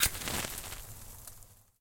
Pumpkin Fire; Game rip; MiSide

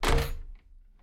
Door Close; MiSide

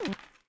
Nope Energy; Game rip; MiSide

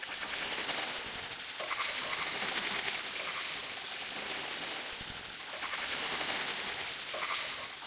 Glitch Furniture; MiSide

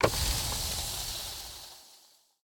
Click Fire Air; Game rip; MiSide

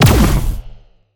Laser Shoot; MiSide

